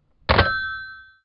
phone drop; talking ben

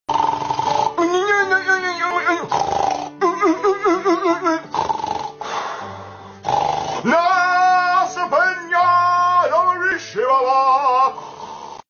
mouse sleep

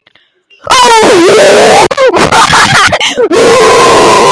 screaming in mic

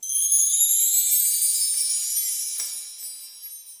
chimes

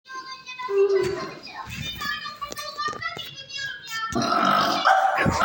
burp turkish

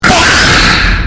dark night jumpscare

